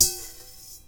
BRUSH BOWL, PERC METALIC; Rarefaction; Poke in The Ear With A Sharp Stick

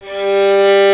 violin, Solo Instruments

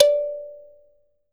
THUMB PI, Kalimbas; E-MU Producer Series Vol. 7 – Old World Instruments

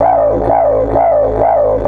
130, Didgeridoo

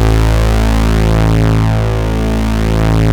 OB A2 -L, Rare Synthesizer; Hollywood Edge - Giorgio Moroder Rare Synthesizer Collection; OB 4 VOX.

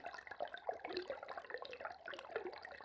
Waterway E Light

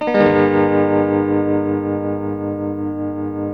TELTMUMIN A, TELE CHORDS, Guitars; Spectrasonics; Hans Zimmer Guitars VOL-1

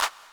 Clap, Yamaha PTX8

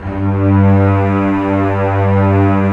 SECLEG G1, Strings

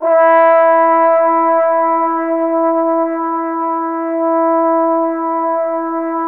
trombones E5; Q Up Arts; Denny Jaeger Private Collection

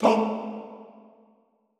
TONG A2, Extended Classical Choir; Best Service; SHORT TONG

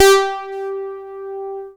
BASS G4; Q Up Arts; Dream Experience

